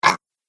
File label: Zombie "Urk"; horror; Zomby Sound Effects